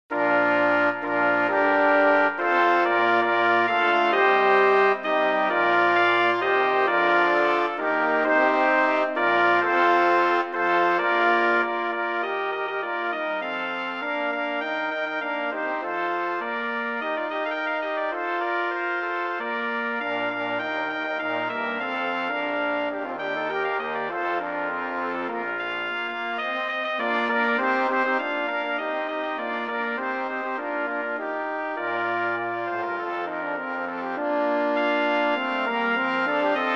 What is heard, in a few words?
Brass Quintet; Exultate Justi